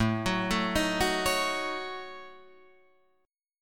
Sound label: A 6th Flat 5th, Guitar, Drop D